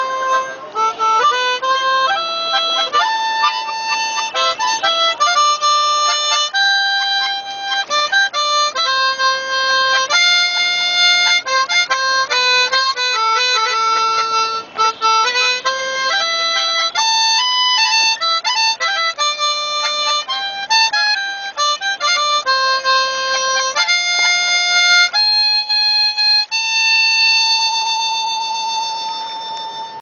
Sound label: Harmonica